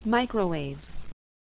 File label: microwave